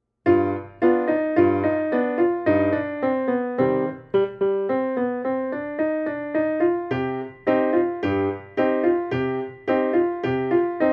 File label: Round Dance 108